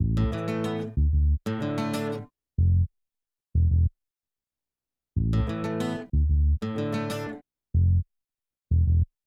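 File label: Bass Guitar Combo; Multitrack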